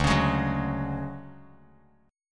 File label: D-Major Sharp 11th, D, Bass Standard; strummed; JGuitar